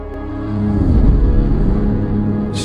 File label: cello; obx